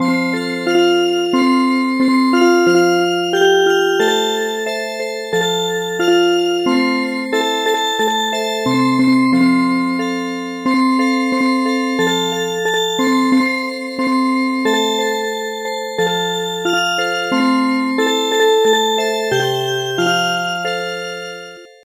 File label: Music Box; A duck